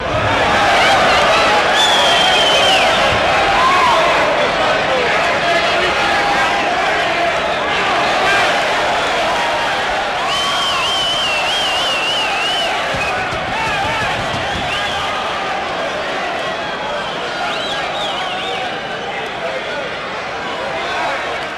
Crowd Excited Reaction to Fight

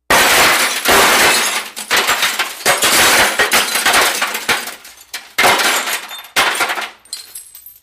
Glass Impacts Shatt; shards

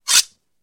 Knife Draws, knives

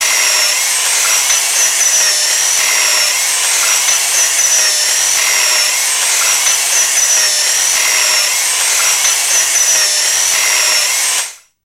Chainsaw Saw Medium

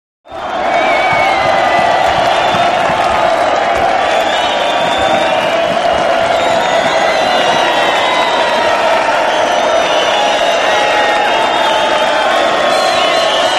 Boxing Crowd Cheers

